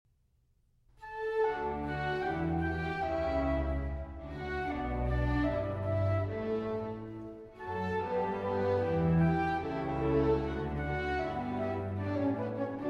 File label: Symphony in G Major, Andante Molto Grazioso; Bryan G6 II.